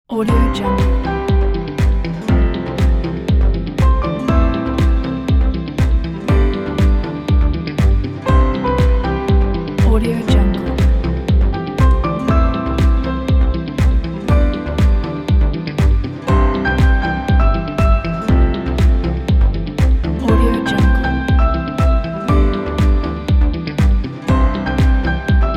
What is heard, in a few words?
supernatural